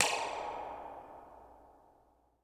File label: SPRING TH; Voice Crystal - Steve Reid Definitive Percussion; ERIE FILM-Q